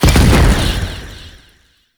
Wraith Leap Jump Land; Enemies; Warframe; Zariman

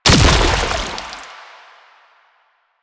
Grenade Explosion; Warframe; Lasrian; Lasrian Soldiers; Weapons; Lasrian Chem Nox

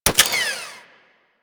Mech, Weapons; Warframe; Tenno; Miter

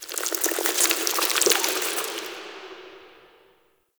Insect Wet Scurry; Ambience; Dungeons; Under City

